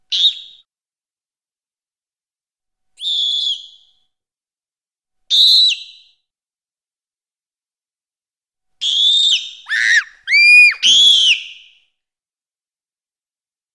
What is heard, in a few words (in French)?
hurlement